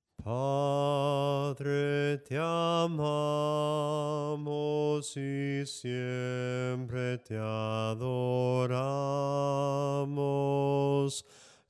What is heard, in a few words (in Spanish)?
SOPRANO CANTADO; GLORIA A TI SENOR; cantos del camino